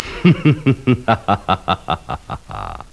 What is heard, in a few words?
hihihaha; sound effect; Nare Gewoontes